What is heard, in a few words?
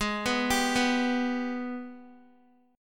Ab Minor Major 7th, down, Guitar; arpeggiated; AbmM7